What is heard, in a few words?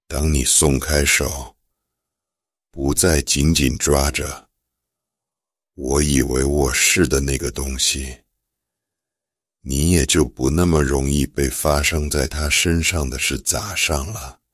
drop, remix